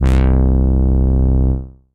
Brass Bass; Analog Bass; Ableton; Factory Packs; Retro Synths; Analog Bass Vol 2

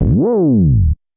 096 R&B Moog Slide A#m; R&B Funk Soul; Synth

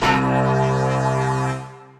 Synth, P-50 Linear; Ableton; Factory Packs; Retro Synths; P-50 Structures

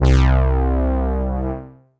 Nacho Bass, Analog Bass; Ableton; Retro Synths; Analog Bass Vol 2

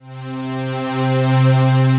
Pad; Gracious Fields Pad; Ableton; Singularities

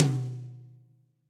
Tom Low Bright Room; Drums; Drum Hits; Ableton; Session Drums Studio; MPE

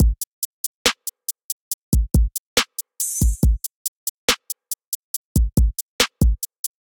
Drift Kit 70 bpm, Trap Drums; Trap Drums by Sound Oracle; Ableton; Factory Packs

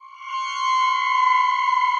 Banshee's Wail, Ominous Pads

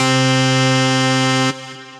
D Cell Organ, Synth Keys

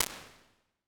Small Clear Room; Ambience and Small Rooms; Ableton; Convolution Reverb

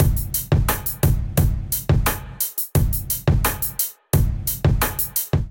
Kit 87 bpm, Drums; MIDI Clips; Canvas; Ableton; Factory Packs; Chop and Swing

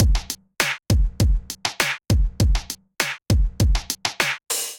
Kit groove 100 bpm, Drum; MIDI Clips; Selector Kit Producer; Ableton; Drum Essentials

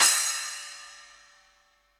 Splash 8 Classic Muffle, Cymbal; Drum Hits; Standard Hits; Ableton; Factory Packs; Session Drums Studio

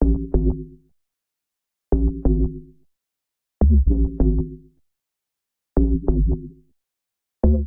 Bass A Major 125 bpm; MIDI Clips; Ableton; Factory Packs; Skitter and Step; Flex

